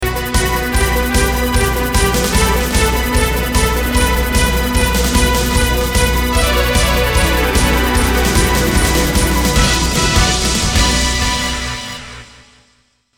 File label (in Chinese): 电视包装音乐